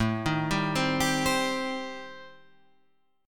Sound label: A-Minor 7th Flat 5th, Guitar Open F, chord; Am7b5; arpeggiated